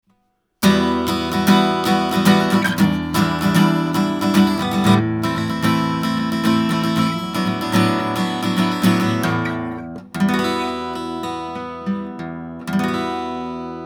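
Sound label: avantone ck 7 Steel string Guitar Fig. 8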